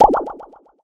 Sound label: bubble pop; Bibi